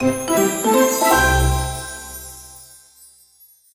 complete

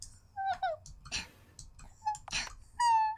a puppy cry; korone; vtuber

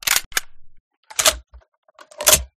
bar reload, guns; resurviv

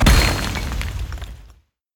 stamp wild appear; jumanji

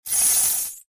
big win; Three Headed Dragon

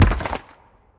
symbol Landed; asgardian stones

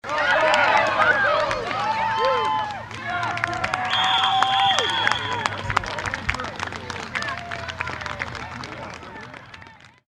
goal small; stadium

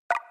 new message

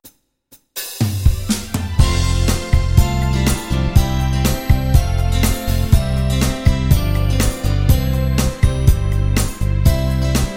market dance

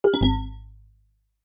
incorrect

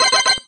car enter

